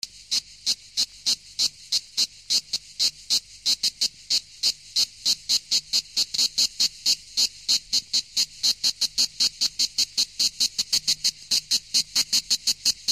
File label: insects cicadas